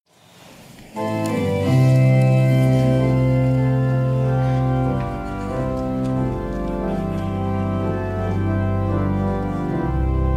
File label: choir